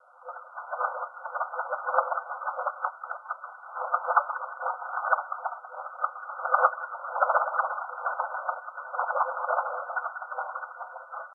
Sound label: Alien Noise